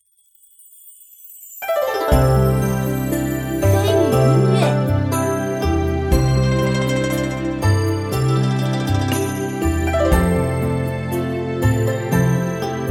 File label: BGM; Hu Lai San Guo; Light Rain Music